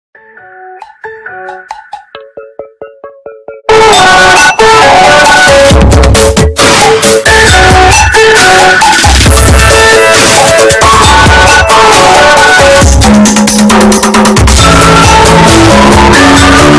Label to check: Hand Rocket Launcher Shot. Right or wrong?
Wrong